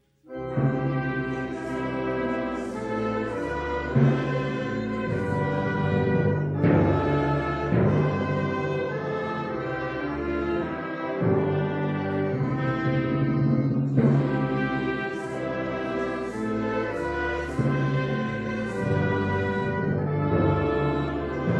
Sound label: trumpet